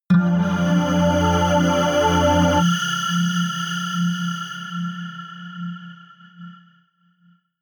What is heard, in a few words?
synth hit steam high; whooshes rises